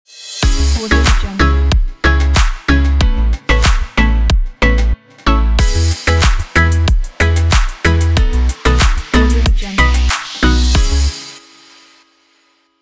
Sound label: summer tropical logo